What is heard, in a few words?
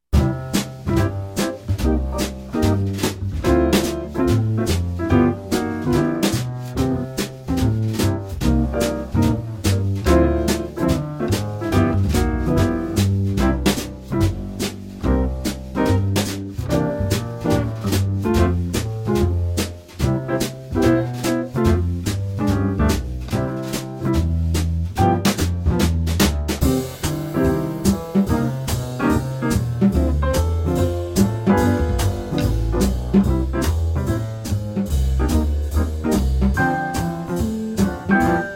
Jazz Quartet; Genre: Country